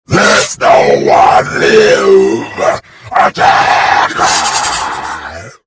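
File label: lizard attack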